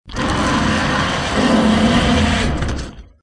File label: Dino Roar